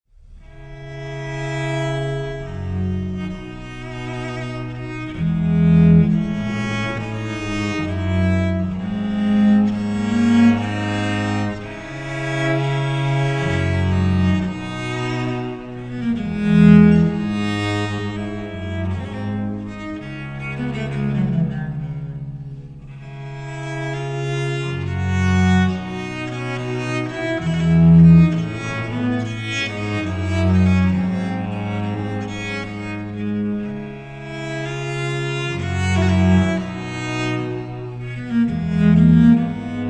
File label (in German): Gambe Viola